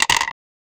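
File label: a single ball being dispensed, plinko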